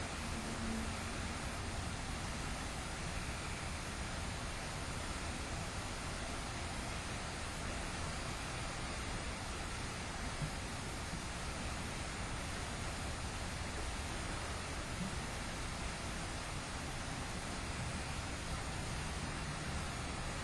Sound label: Ticket Booth Unexplained Footsteps; Case 022 Lake Shawnee Park; Olympus Plug